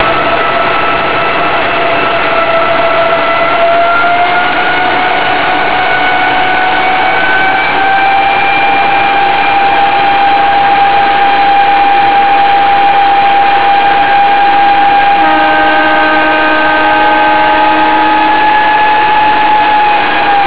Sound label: ydm4 dynamic brake